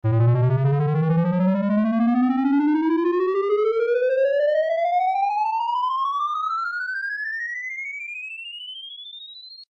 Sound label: collect go; Ancient Forest